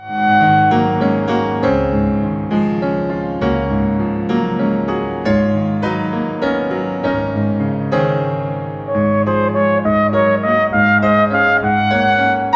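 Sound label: vocalise trumpet, excerpt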